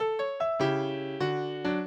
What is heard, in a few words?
minuet, mozart